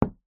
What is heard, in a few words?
sport pool ball set down on pool table